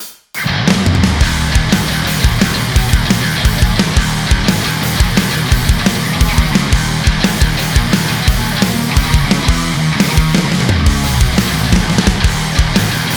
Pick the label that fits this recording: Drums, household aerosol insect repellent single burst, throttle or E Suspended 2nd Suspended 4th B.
Drums